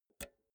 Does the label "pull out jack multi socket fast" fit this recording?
Yes